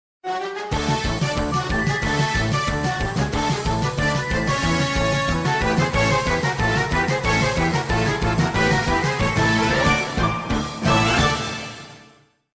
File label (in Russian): vesel pogonya